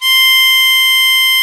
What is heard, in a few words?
HARMO C5, ORGAN; AKAI S-Series CD-ROM Sound Library VOL-8